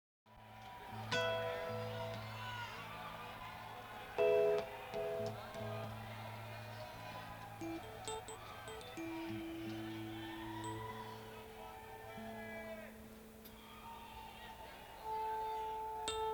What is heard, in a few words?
tuning